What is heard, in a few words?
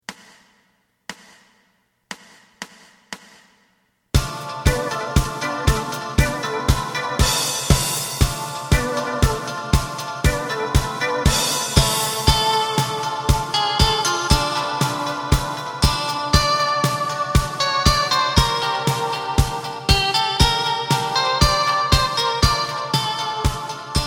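bass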